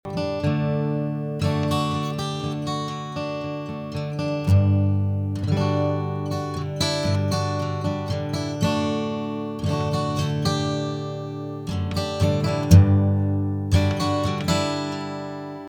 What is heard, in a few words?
md441 roundwound strum